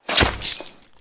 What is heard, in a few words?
Cleric Scimitar Hit; SyrinScape; Missing Magistrate